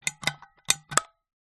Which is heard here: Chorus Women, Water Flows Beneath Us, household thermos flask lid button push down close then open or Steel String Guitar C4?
household thermos flask lid button push down close then open